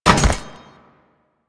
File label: Ledge Grab; characters; generic foley; Warframe